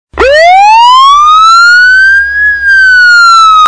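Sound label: notification, Missed Events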